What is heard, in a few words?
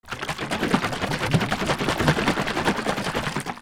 HOUSE